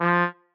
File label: marimba trombone; ゆめ2っき